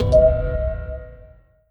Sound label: ascension perk unlocked; Stellaris; utopia